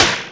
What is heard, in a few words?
RX5 FM P; Hollywood Edge; The Big Bad Beatmachine